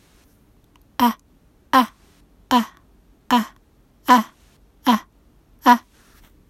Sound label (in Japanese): アッアッアッ